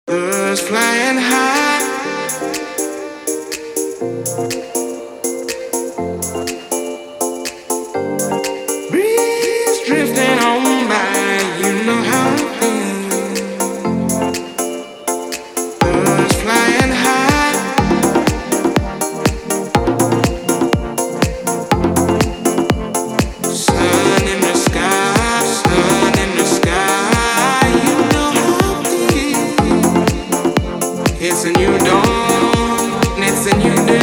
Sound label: birds flying high